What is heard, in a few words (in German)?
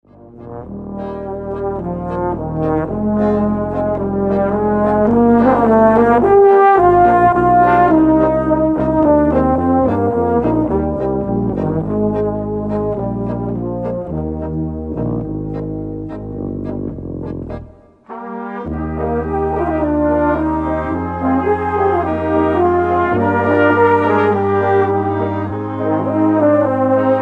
Blaskapelle